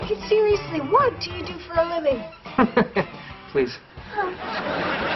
Hahaha Please

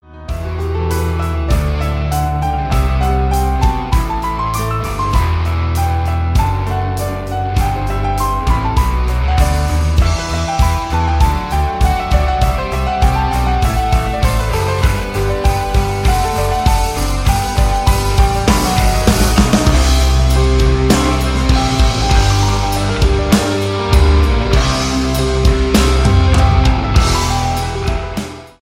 Piano Passage

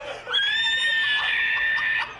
pterodactyl

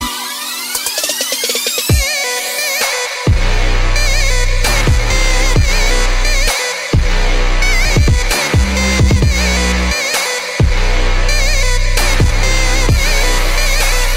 trap; ghost buster

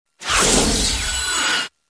Message Tone

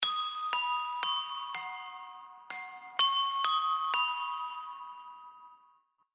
Big Ben Chime sound

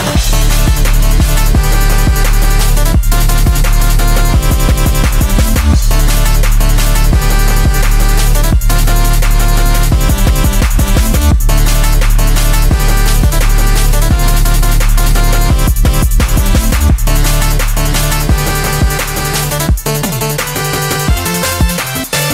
Remix; Shovel Knight

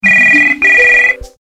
Digital Ring Sms

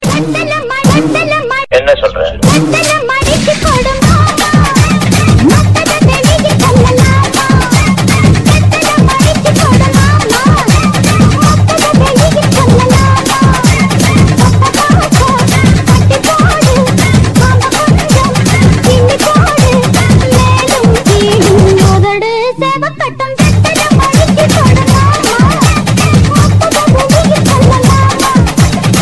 Tamil Folk Mix

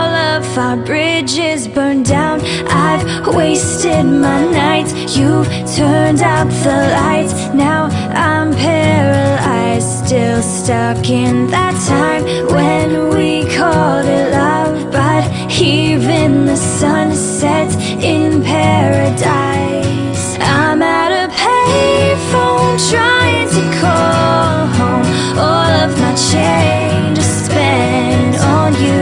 Payphone

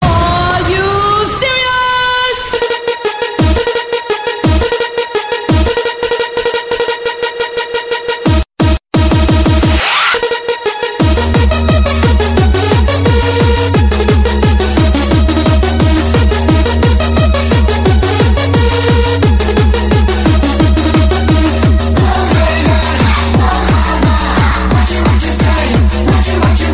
Dj Techno

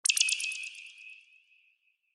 Water

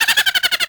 Spring; Eggman

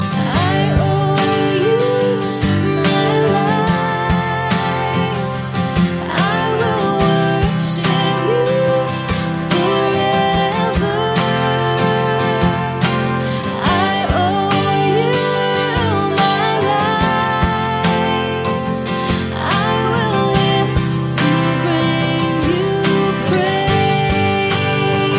Gospel Religion

